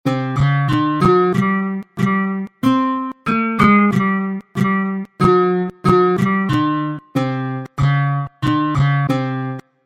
Song in Pelog

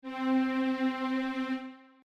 prim, Grundton; Zuerst der Grundton

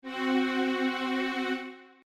tritonus

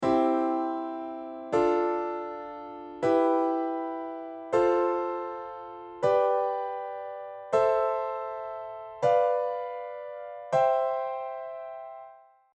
akkordstufen; Hören Sie, welche davon Dur- und welche Moll-Akkorde sind? Und dass der siebte Akkord weder das eine noch das andere ist?

